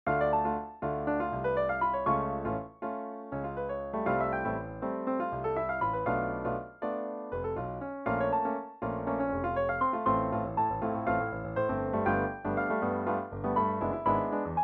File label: synfunk